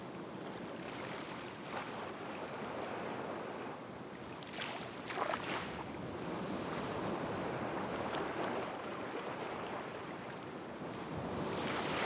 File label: Live Recording - Waves; Cymatics; Orchid Sample Pack